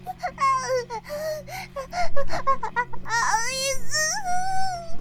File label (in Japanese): バイブ放置, ASMR